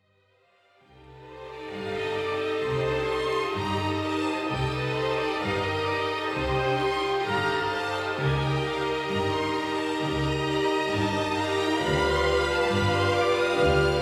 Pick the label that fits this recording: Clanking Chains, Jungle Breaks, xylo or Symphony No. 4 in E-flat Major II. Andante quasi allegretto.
Symphony No. 4 in E-flat Major II. Andante quasi allegretto